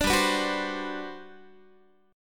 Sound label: G Major 11th, Guitar; Listen to GM11 strummed; GM11 Chord